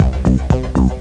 bar, Music; 12 bar.c.05; Swoop 1.0.2

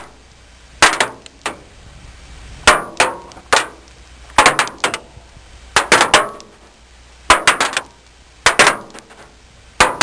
Rocks Hitting Metal

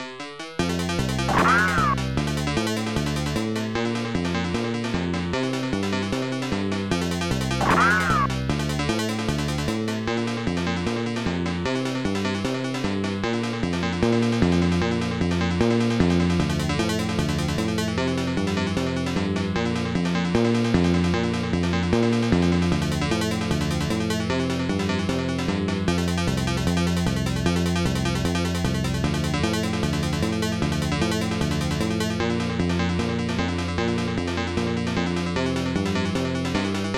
melody; CYBER.XPO.95 (Arsenal Computer); amiga; boomfild

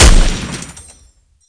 shot; fragile assault

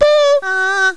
BOOAUH

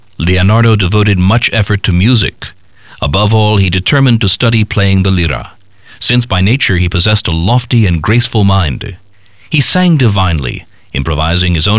BELLS

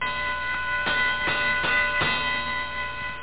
fire bell

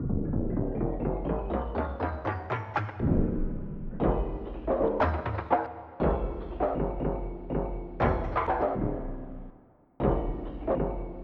ethnic drum

